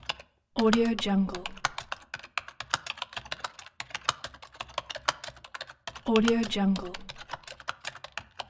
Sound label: keyboard typing